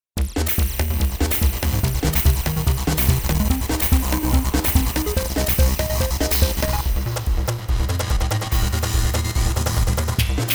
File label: Creeping Voltage